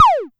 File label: Amdek Perc